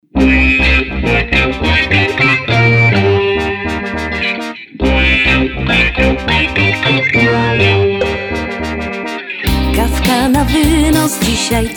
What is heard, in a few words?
jazz